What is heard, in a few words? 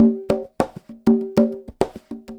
Conga Loop (100BPM); musicradar